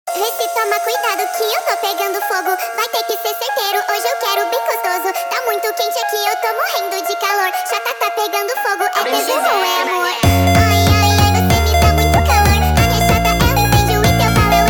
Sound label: automotivo